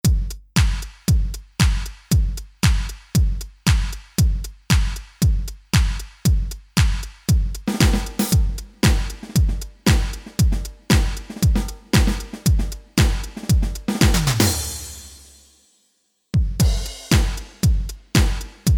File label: Only Bass, funk